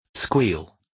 squeal

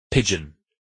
pigeon

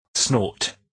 snort

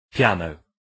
piano

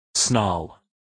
snarl

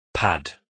pad

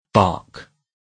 bark

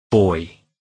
buoy

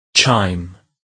chime

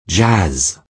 jazz

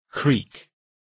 creak

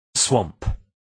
swamp

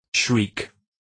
shriek

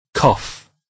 cough

